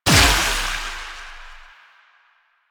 Explosion; Warframe; Weapons; Moa Pet; Multron

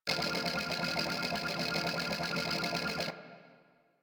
Space Drone Stasis Beam Loop

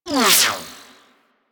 laser whizzby; Enemies; Warframe; Vomvalyst; Teralyst; DE

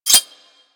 Whip Mech Rotate; Grineer

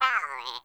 Flying Robot Voice; Quests; Veilbreaker; Ambience; Kahl Quest

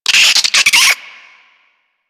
Infested Minion Bark, Vocals; Warframe

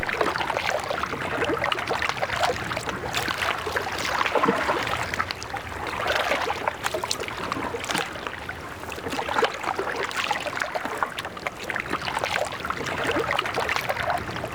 Calm River Water Laps; Ambience

